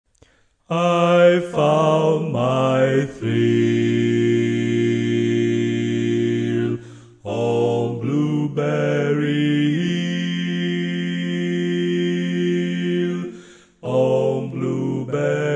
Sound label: Bass, barbershop tags